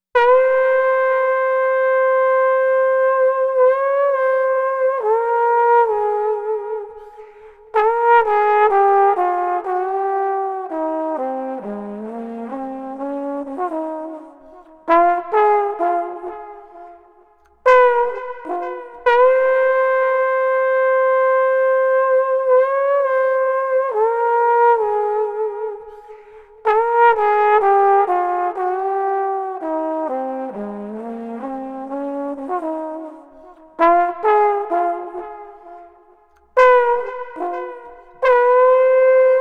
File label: Street Trombone Lonsome Melody 63